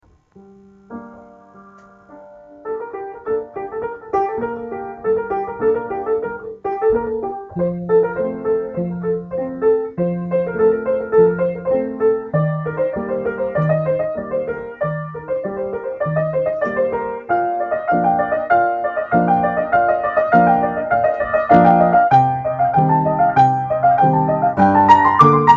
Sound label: prelude in g minor, keyboard; juvenilia; Ben Tibbetts